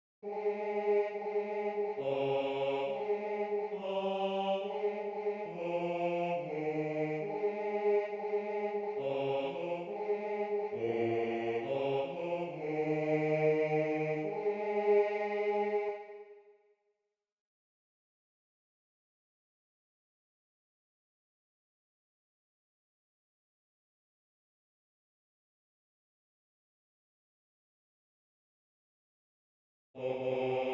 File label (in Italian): Basso; Base basso